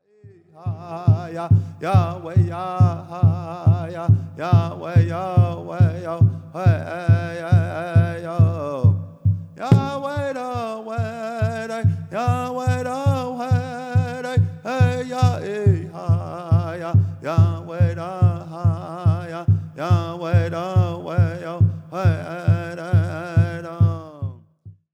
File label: Exit Song